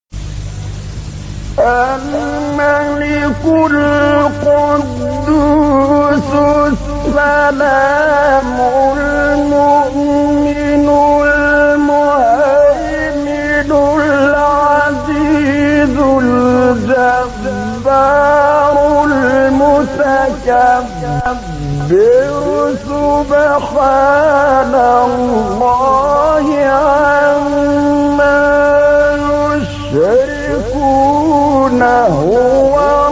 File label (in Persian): تلاوت زیبای آیه سوره حشر; استاد طبلاوی